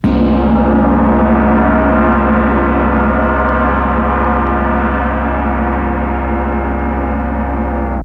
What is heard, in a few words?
Gong, Mellotron Samples